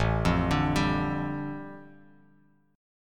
A 7th Suspended 4th Sharp 5th, A, down, Bass Standard; A7sus4#5 arpeggiated